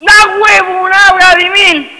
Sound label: headshot